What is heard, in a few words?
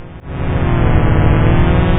spooky, synth